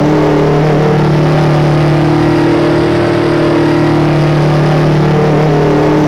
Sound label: i4 petrol, engines; i4 petrol16 2; ACF CustomMod